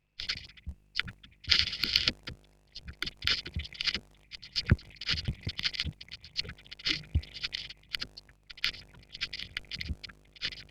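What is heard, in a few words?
Vocoder pseudo stereo, Schallplattengeräusche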